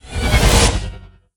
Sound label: riser; smite; heimdallr